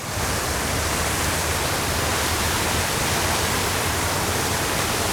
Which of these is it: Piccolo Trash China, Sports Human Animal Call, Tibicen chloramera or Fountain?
Fountain